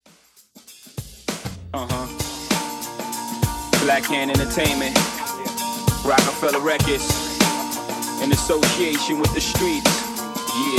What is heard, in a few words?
Rap Version Intro Alternative Rock Re Drum 98 BPM